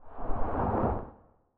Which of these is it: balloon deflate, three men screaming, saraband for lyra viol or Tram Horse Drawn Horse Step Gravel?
balloon deflate